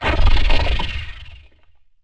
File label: Teleporter Abduction Teleport Back; Evolve; Wraith